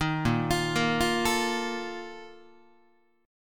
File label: A sharp Major 7th Suspended 4th, Guitar; arpeggiated